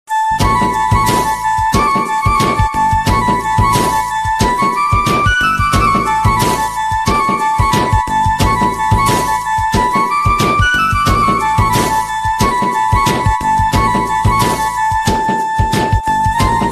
Organ Ringtone

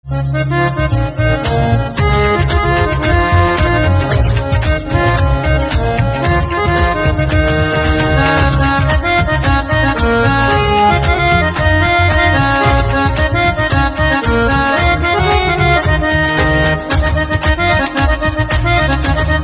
Harmonium Instrumental Ringtone

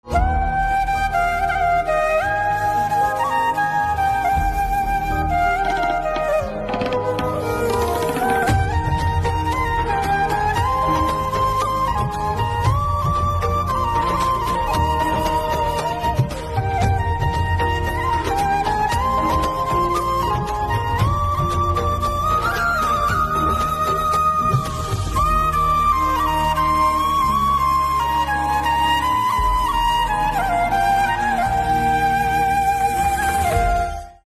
Flute Instrumental Ringtone

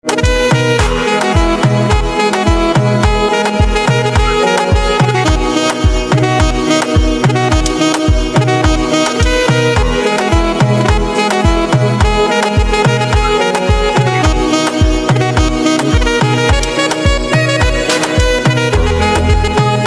Saxophone Instrumental Ringtone; english instrumental ringtone

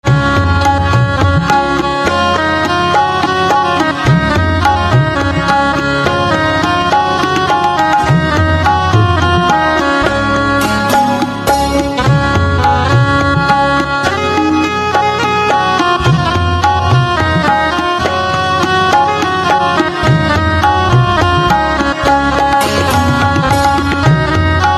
Saxophone Instrumental Ringtone; desh bhakti instrumental ringtone

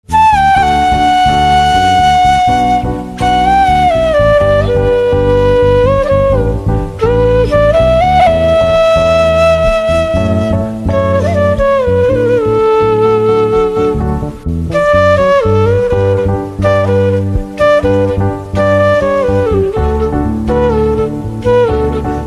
Flute

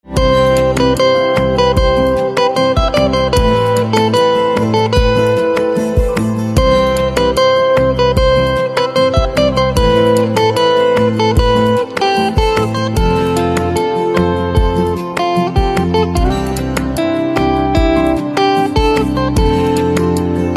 Guitar Ringtone

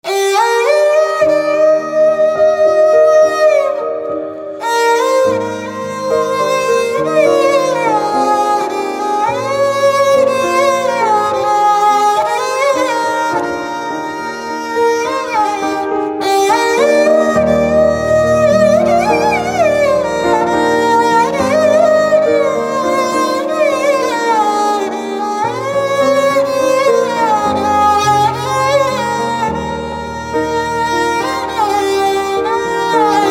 Violin Instrumental Ringtone

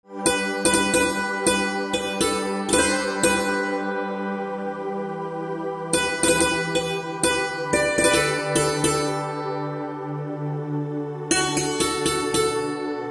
Santoor Instrumental Ringtone, devotional

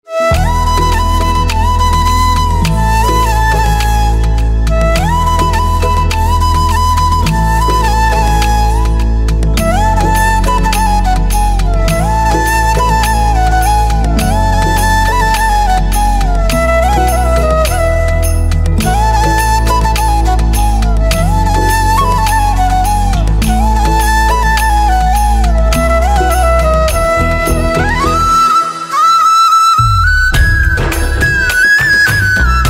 Flute Instrumental Ringtone

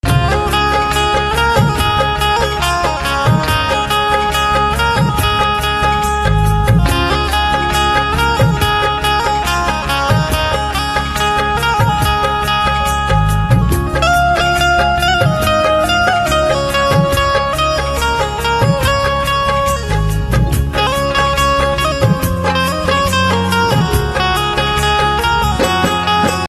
Banjo Instrumental Ringtone

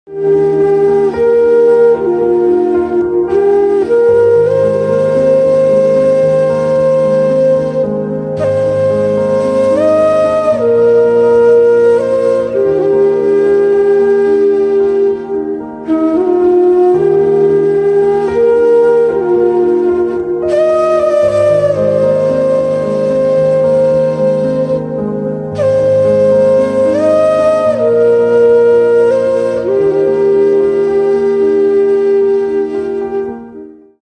Flute Instrumental Ringtone

